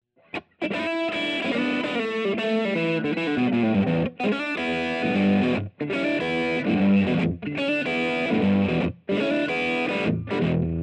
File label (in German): E Gitarre OD UAD Apollo Twin